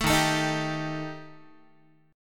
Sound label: Eb Major Flat 5th Eb Guitar; EbMb5 strummed; 12,11,12,13,11,x; JGuitar